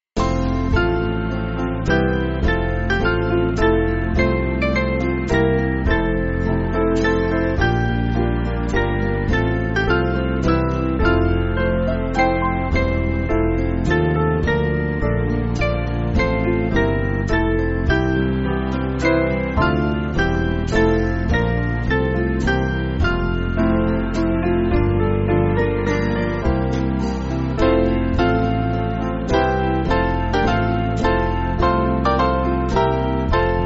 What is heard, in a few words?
Band, church music